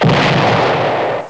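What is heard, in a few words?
explosion; waycoolgames; escape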